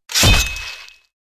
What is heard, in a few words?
Battle axe body hit